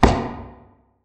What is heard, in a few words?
footstep; Barotraumatic; xanHuskmutanthunter